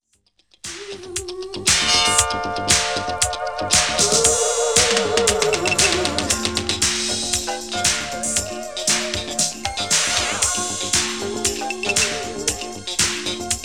FUNK

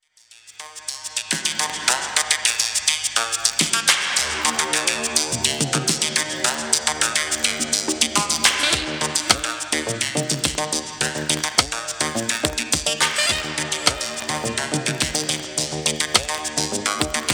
SAX

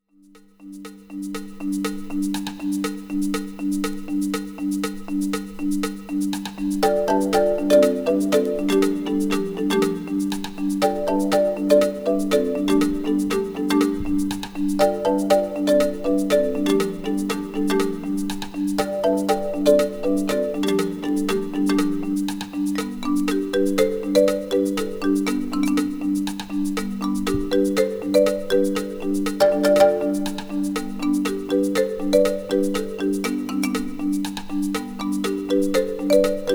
funky

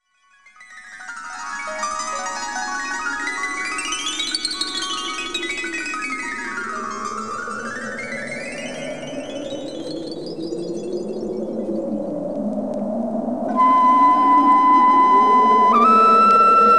GONG

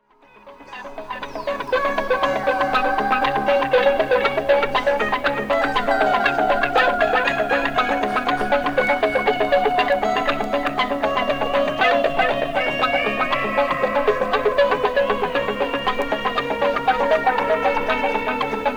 GONG

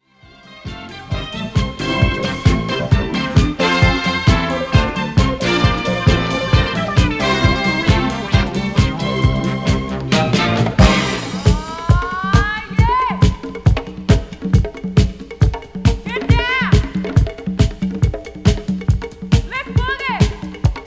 disco

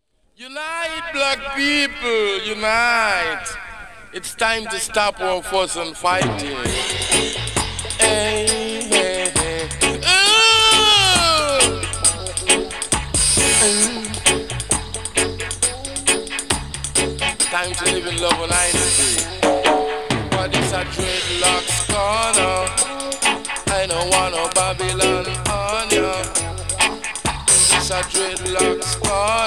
JAZZ

